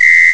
jail; HBMonopoly

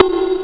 use armour

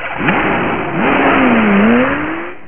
start; robossy

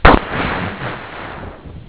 THUNDER

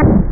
BANG; ReaderGames; TankedUp

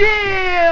YEEAA

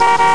Car Horn

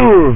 oof!

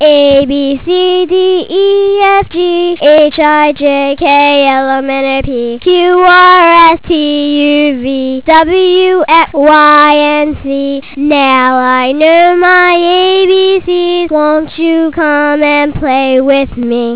ABC SONG

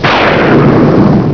explode

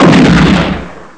EXPLO

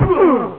hit wall

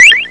bonus; downhill challenge

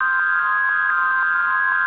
RAIN MOVE

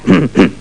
HUH; KRSNAKE

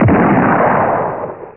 Xplo medium

